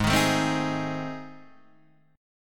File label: A Minor Major 7th Flat 5th